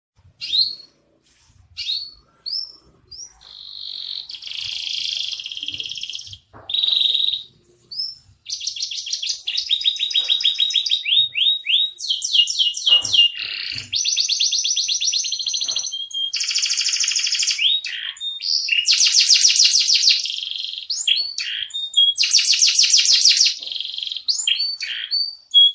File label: male canary